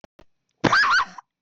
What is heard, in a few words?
laughing